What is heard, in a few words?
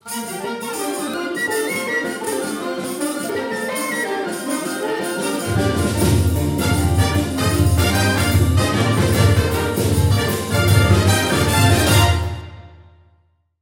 Star Tone, Concert Band